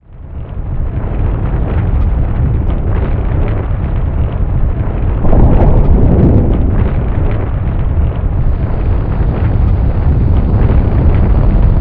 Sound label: quake